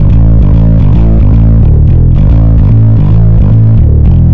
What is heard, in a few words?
Bass, Distorted A; Bass X